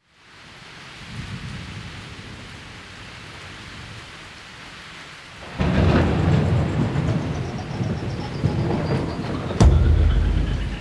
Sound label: Digital Rain Anthem